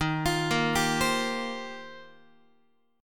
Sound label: Eb 6th Add 9th, Guitar; Eb6add9 arpeggiated; x,11,8,8,11,11; JGuitar